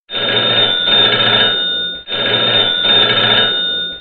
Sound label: Dring Ring